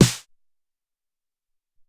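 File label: Dan Snare PP, Alesis SR-18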